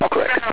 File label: correct